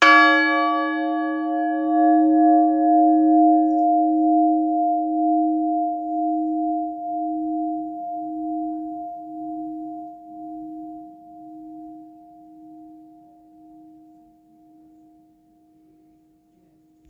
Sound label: bells, stoughton four